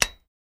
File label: Light Switch On